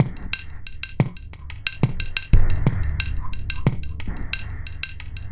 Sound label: Minimalism, Processed Percussion